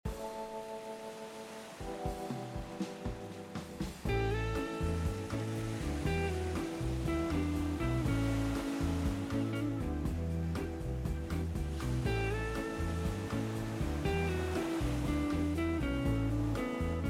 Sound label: BGM Thailand